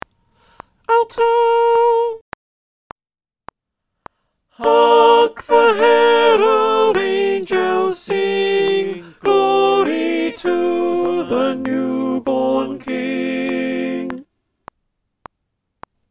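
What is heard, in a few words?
Alto refrain click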